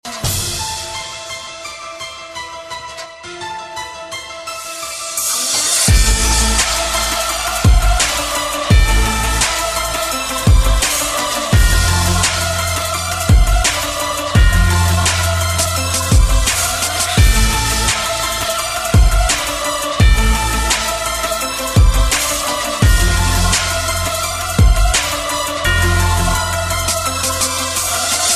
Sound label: Scary Beat Ringtone